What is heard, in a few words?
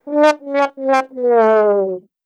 sad Trombone